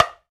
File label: TONG, African Perc